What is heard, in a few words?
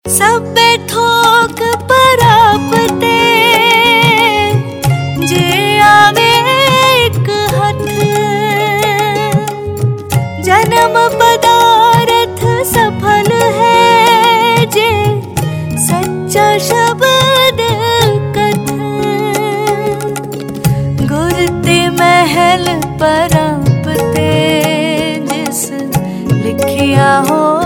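Ringtone, Punjabi Pop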